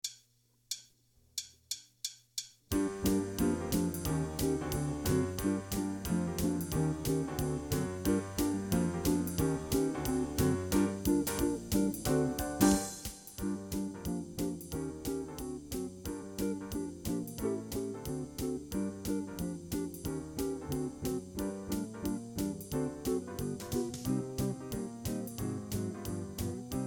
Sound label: sweat zoot winds cut, band